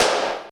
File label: AMB S, HALL KITS; Crypton - DrumBase5000